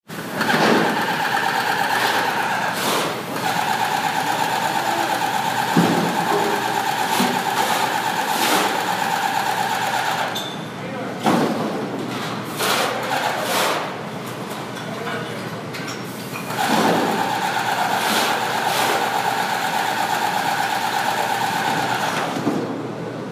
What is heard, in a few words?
Noise